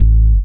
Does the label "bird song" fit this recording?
No